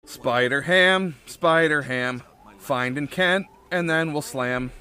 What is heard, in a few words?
spider slam